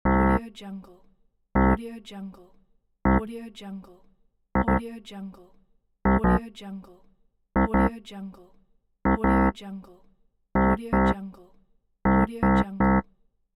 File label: error; ponyrai